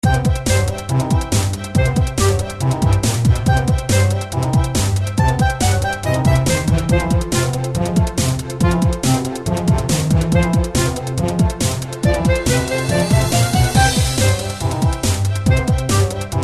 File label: free bgm